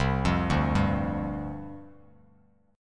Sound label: C Suspended 2nd Suspended 4th C Bass Standard; arpeggiated; 8,8,0,0; JGuitar